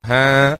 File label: huh, Voice